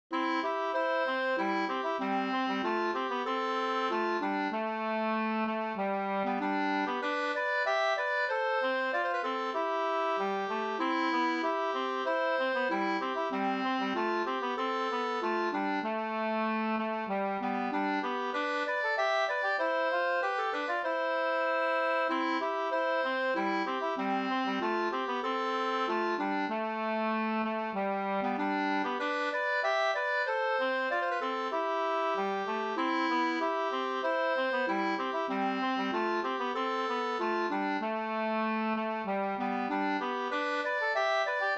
ganglat; fr Boglosa Up av Helmer Melin

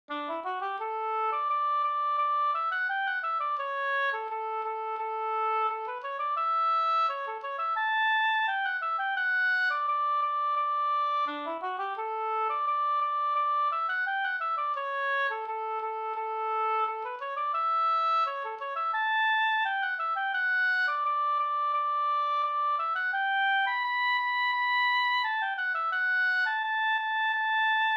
brudmarsch; Prastmarschen

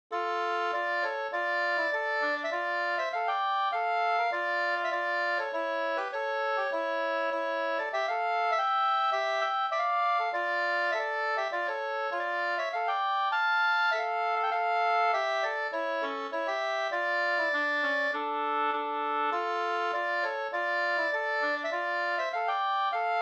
brudmarsch Sorglat; fr Transtrand

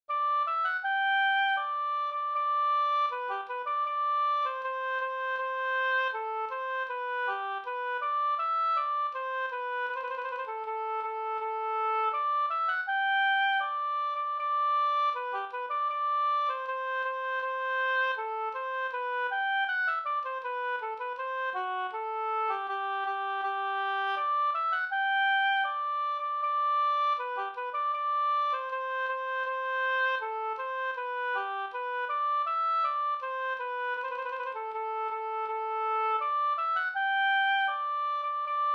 brudmarsch Gotlands brudmarsch

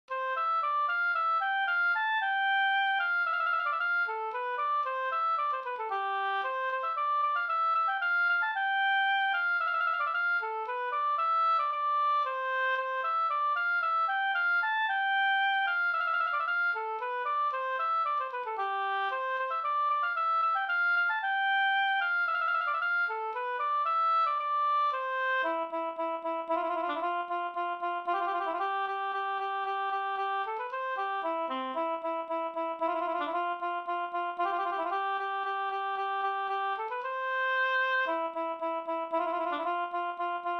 slängpolska

